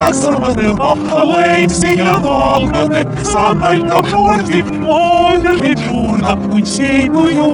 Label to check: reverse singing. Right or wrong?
Right